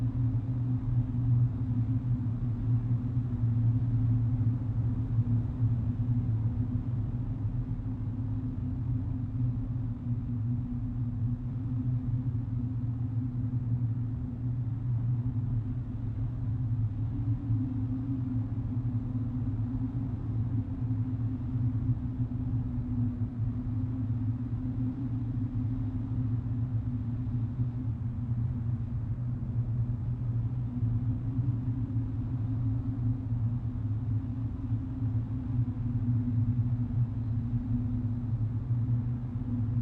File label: Ambience Bacon Table; Ambience Fizzle BaconTable